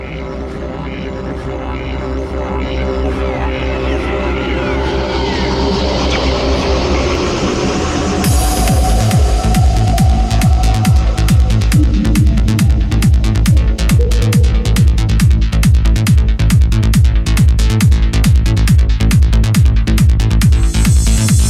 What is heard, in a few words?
TANTRIC TRANCE